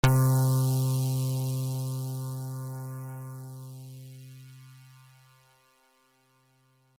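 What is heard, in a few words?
noise pad C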